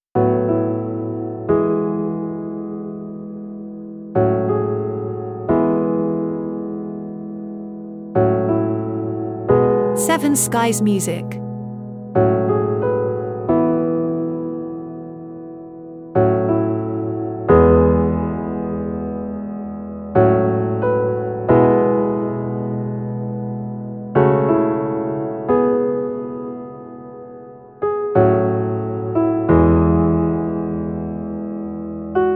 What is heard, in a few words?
Little Light solo piano